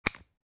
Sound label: Plug insertion cable click; headphones and speakers